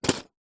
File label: office pencil case drop